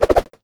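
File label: triple swing; breakhack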